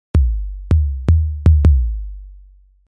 808 kick als bassline

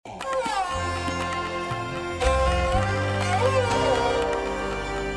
orientalisches instrument

